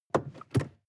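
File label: sports car interior seat handling short; verkehr transport; autos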